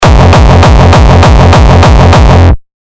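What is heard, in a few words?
909 saturated oldschool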